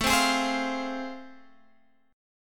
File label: A Minor Major 7th Sharp 5th, Guitar Half Step Down; AmM7#5